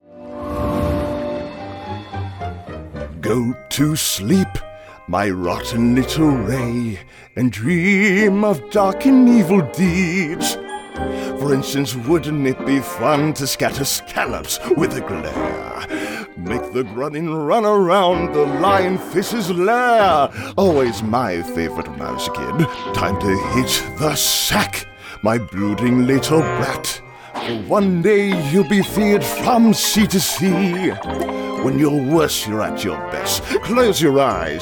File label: Singing